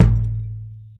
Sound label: Huge Tom Drum Sound A Key, tom sample tuned to the A note. Loudest frequency: 184Hz